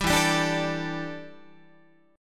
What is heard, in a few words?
E Minor 11th, Guitar; Em11 strummed; 10,12,10,12,13,13; JGuitar